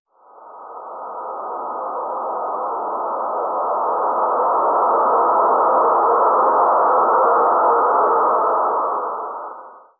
sound effect of a super, superhero

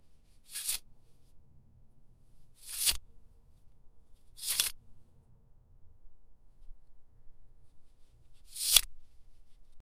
create a very quiet subtl, time capsule

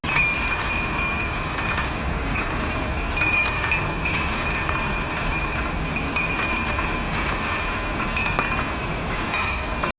rolling fog with distant, bell

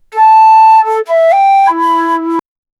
a calm three note flute

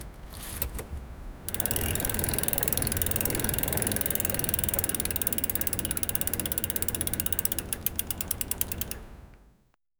get off from the bike

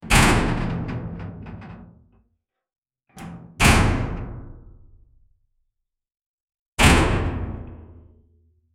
metallic heavy door closi; closure

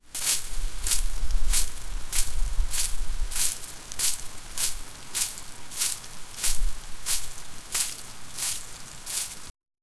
fast running grass

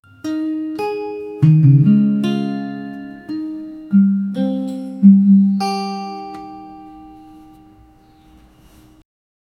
create a warm peaceful, acoustic guitar

